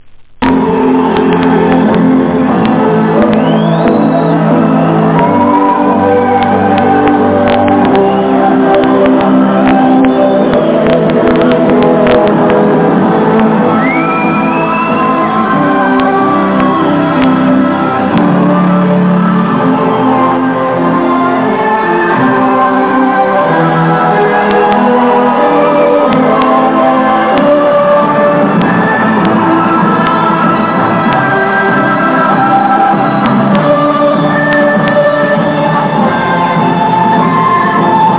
metalic

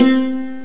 SANTUR; Chromatic Percussion; GMPlay

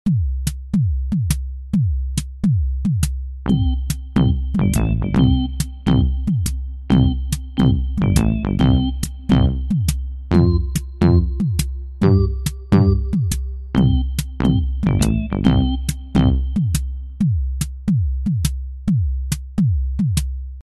game loop; pet

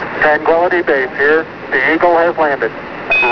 tranquil

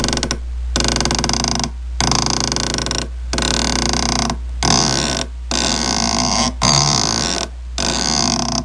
Squeaky Wood Swing

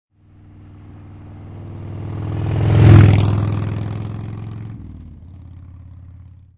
harley; WMBonusXP

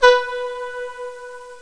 VIOLA

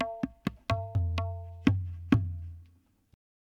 PERC LOOP ETHNO 125

